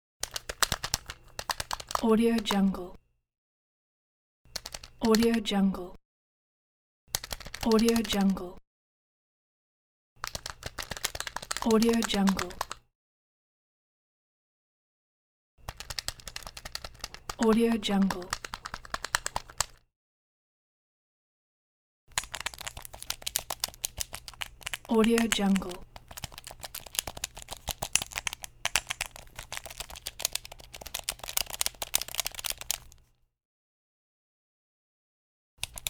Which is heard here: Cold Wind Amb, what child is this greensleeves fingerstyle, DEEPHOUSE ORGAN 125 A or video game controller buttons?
video game controller buttons